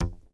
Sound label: incorrect